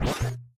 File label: Platform Eating Eating